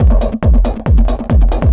138, Techno, drum loops

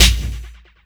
drum 138, drum-loops, 2bass, Techno; Techno ID

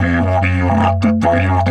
F 140, DIDGE RIFFS; Big Fish Audio - Didgeridoo and Other Primitive Instruments

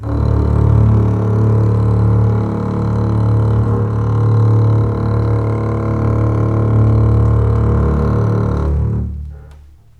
D1 LEG F, String; Ultimate String Collection

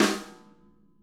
SNARE, Interactive Drum Kits

